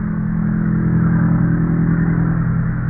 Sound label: machinery drone